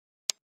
Schalter klein

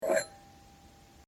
Glaeser

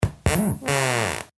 Tuer einer Musikanlage

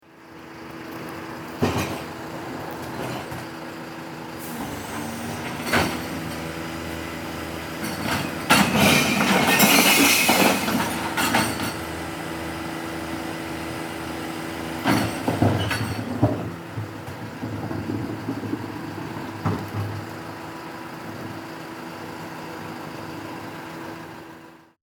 Glascontainer

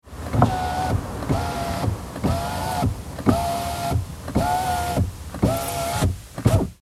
Auto Fensterheber

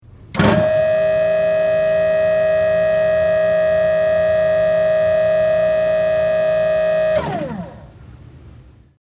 Gabelstapler heben

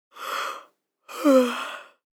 gaehnen

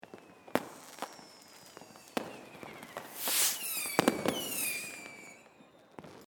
Silvester Atmosphaere

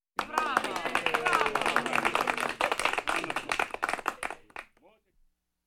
Konzertstimmungen Applaus Jubelnd

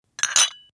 Tasse abstellen

